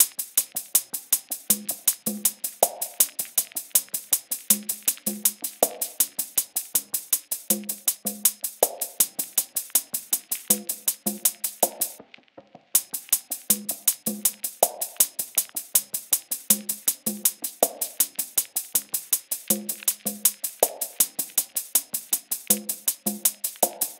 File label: tops 80, Drum Sample Pack Loops Top Loops; SD SEISMIC; Slate